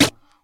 Nineties Short Snare Drum C# Key, Royality free snare single hit tuned to the C# note